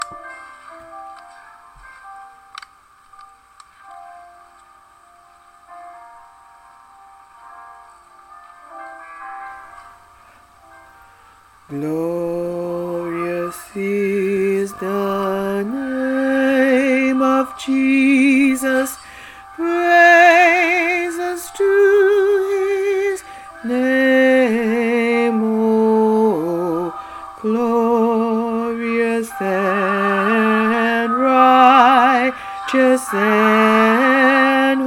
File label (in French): BASSE